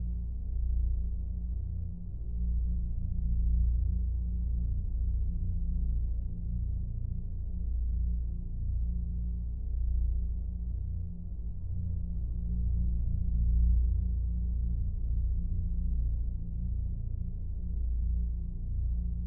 Synth Drone; Jump King

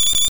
Heal Bell Loop

